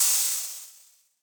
lava; minetest; builtin item

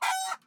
chicken hit; minetest; mob-engine; creatures

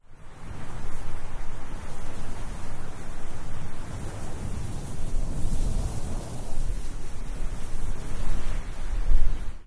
wind; minetest; ambience

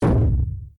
throwing dig arrow; minetest; throwing arrows

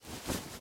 wool coat movement; minetest

